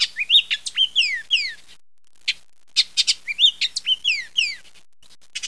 oriole bullock; bird

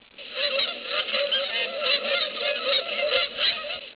Canada Goose; bird

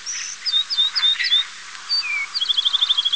Wren Bewicks; bird

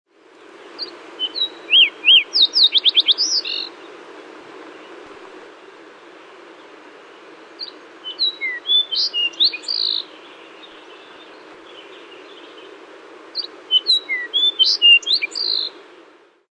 Sparrow Lincoln; bird

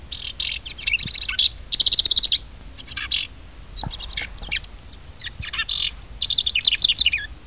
wren; bird

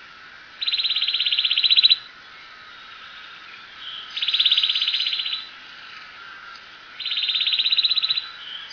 Junco; bird

